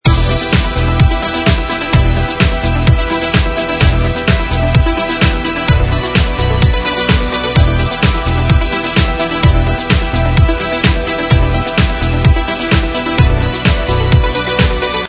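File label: Fashion Show Hook Loop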